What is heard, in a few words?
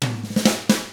127 Drums